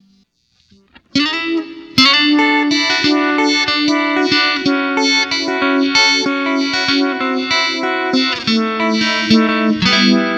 clean Uni-V, Boss GT-8

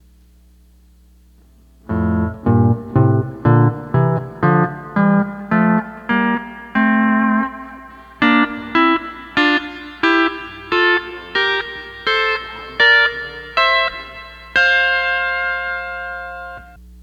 12 String Lap

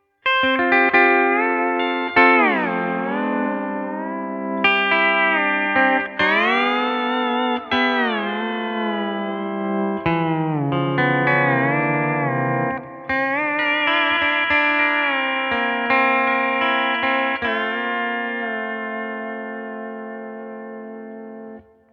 Deluxe BA, AXE FX 3

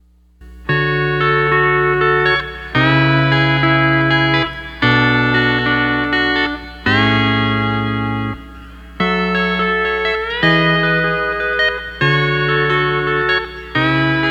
12 String Lap

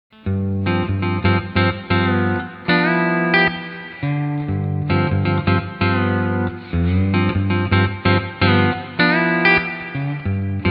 D13 Tab Low String Vamp; D13 Copedant Tabs

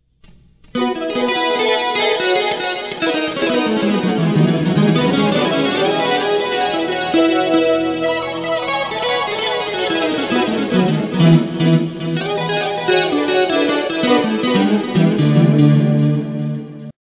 SLO GEAR PS, Boss GT-8

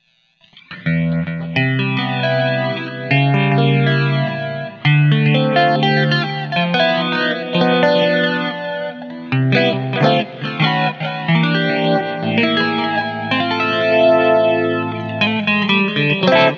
chorus crunch, Boss GT-8

